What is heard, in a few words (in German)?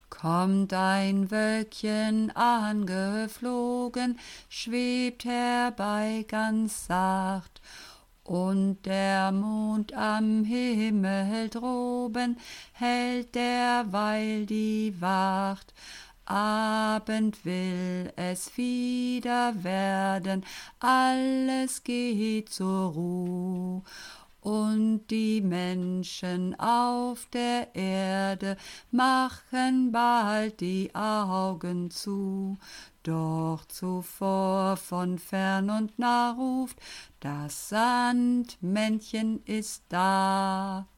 Bass Frauen; Kommt ein Woelkchen angeflogen